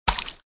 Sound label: Bleed